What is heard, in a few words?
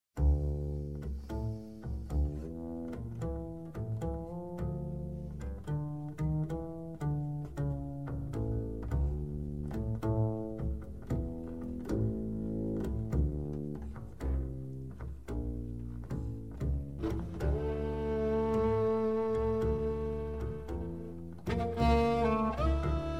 Latin Ballad